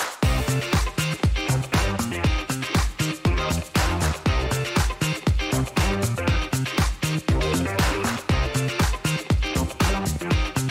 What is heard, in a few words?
lobby